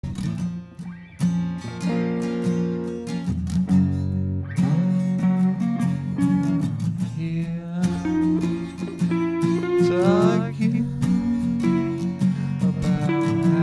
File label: acoustic